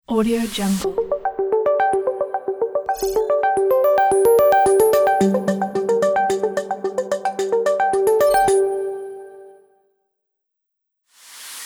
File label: happy calm logo